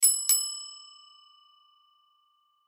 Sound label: Bell Store Clerk, Bell Store Clerk Hotel Ring Ding; Bell Store Clerk Hotel Ring Ding Two Times; Blastwave; Foley